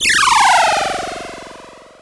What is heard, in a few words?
power-down